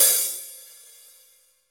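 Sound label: hi-hat, hi-hat real; Sound & Vision - Gigapack I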